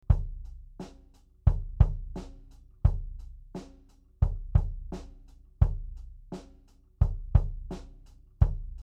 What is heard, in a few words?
kick soft 15cm